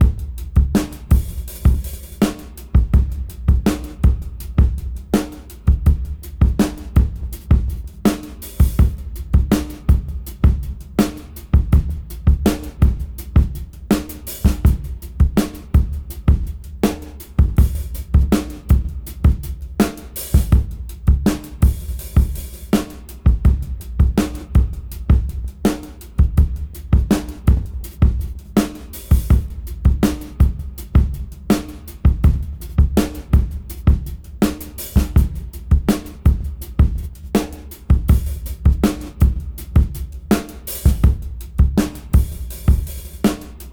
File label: 82 BPM Rev; AMG; Keith LeBlanc Phat Butt Jams